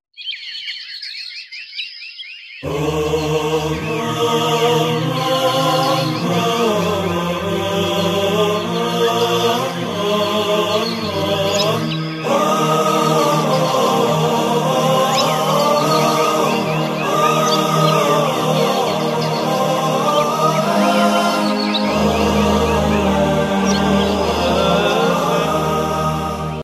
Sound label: vocal; مذهبی